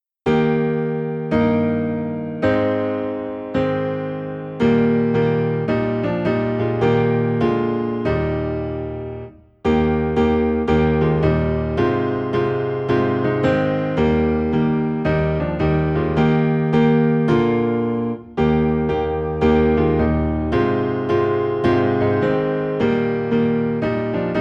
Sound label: Piano Low in E Flat; Leaning On the Everlasting Arms